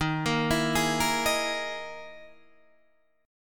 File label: E Major 7th Flat 5th